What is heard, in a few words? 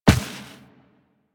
Walk Dirt, Footsteps; Warframe; Operator